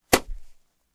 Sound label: castle damage; Hold Em